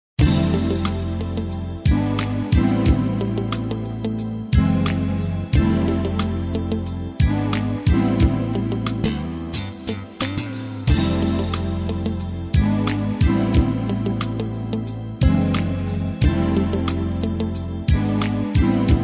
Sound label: brass minus